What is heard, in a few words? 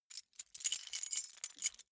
key ring mid size rattle; objekte; schluessel